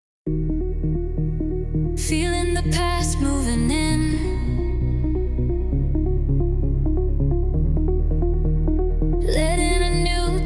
Trance Hold

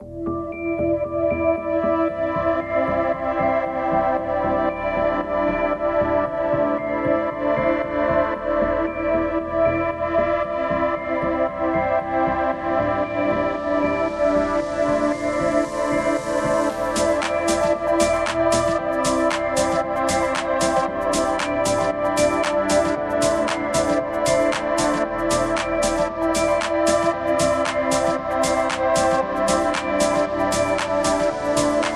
electro dance

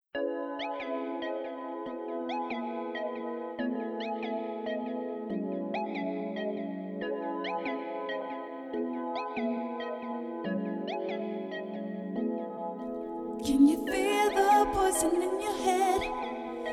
vocal chill, 432 hz

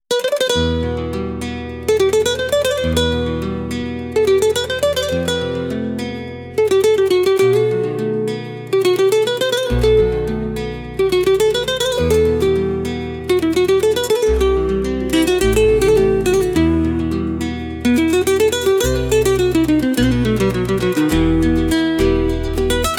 flaming strings

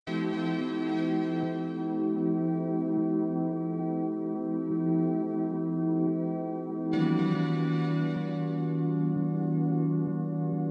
chillout music, 432 Hz; music in action

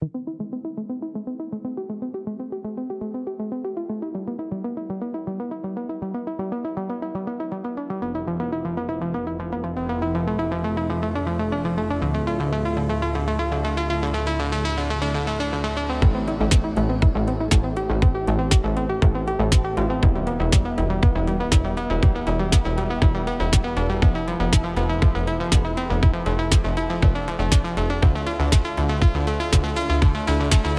Electronic Impulse

